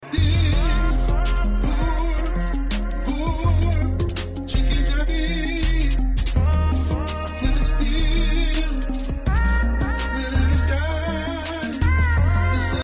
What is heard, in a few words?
Singing; Vorp; Meme